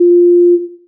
TONE

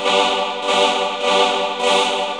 KEYB; MAGIX

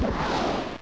WOOSH; JOSEPHIN

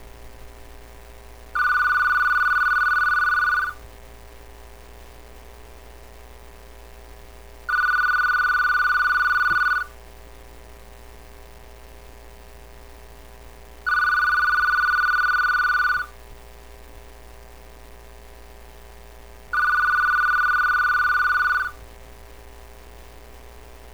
PHONE

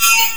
WAVE; cubase; ZXFX

